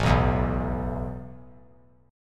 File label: A Suspended 2nd A; Asus2 strummed